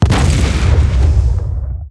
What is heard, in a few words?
firescream; Darklord